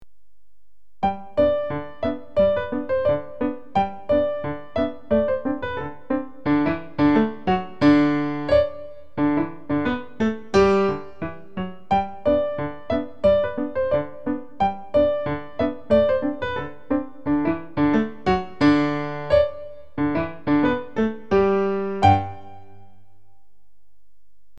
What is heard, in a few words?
Ragtimesolo